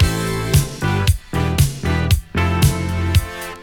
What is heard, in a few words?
beat